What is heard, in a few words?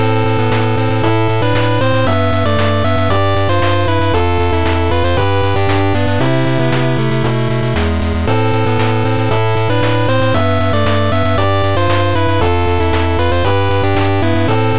8bit